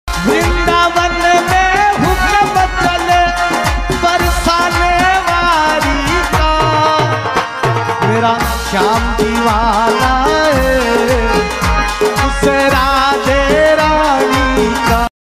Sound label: bhakti ringtone; radha krishna ringtone; hindi